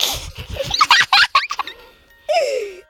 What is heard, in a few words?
laugh girl; ooh ahh